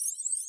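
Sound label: item bioscanner loop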